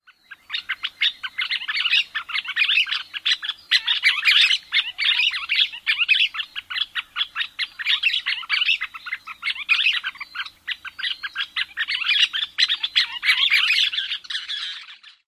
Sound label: winter group calls